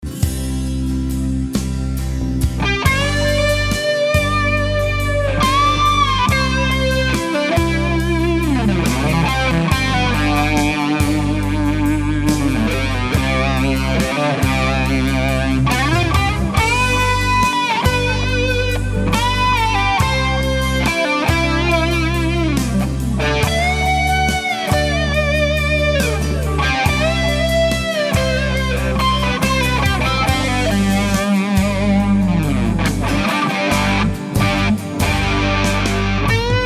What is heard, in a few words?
Ram Muff; BK Butler-Chandler Tube Driver; Boss CE-2 Chorus; Nova Delay; Solo with backing track